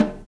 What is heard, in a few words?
Beguine, Percussion; Roland BK-5